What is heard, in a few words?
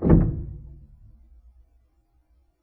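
Ped Noise Etc Single Push, Piano; Piano Miscellaneous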